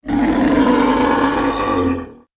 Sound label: Pet Buffalo Angry; creatures pets; Lego